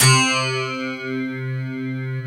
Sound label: FM ELP C2, Keyboards Old School; USB Soundscan vol.09; AKAI; FM ELP 7